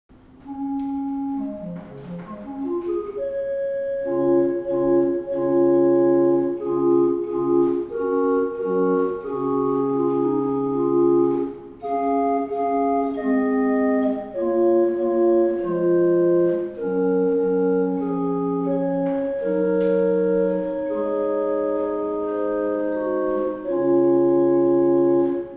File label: Grob Gedackt 8, Tannenberg Organ; Gemeinhaus Saal - Old Salem, North Carolina; improvisation on the various stops alone and in combination; Tannenberg's organs in Salem; improvisation on the various stops alone and in combination (recorded November, 2009)